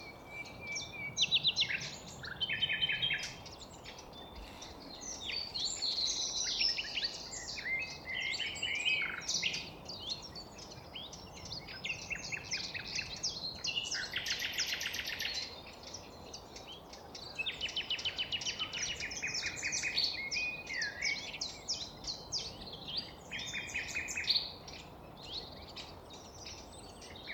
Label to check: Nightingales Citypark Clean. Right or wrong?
Right